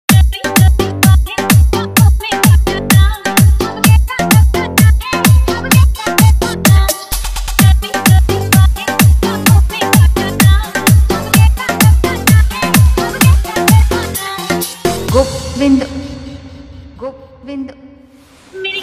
90s Trending Viral Hindi Dj Remix Song Hard Electro